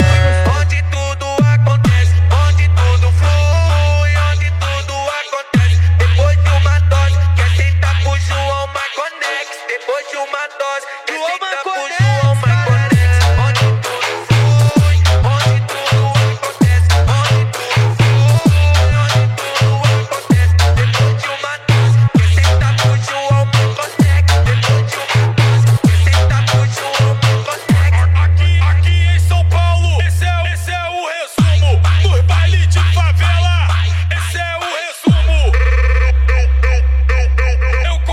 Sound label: funk bass